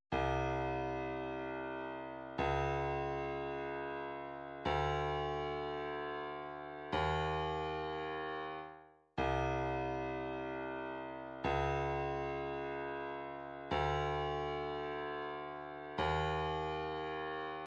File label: 109 clap, midi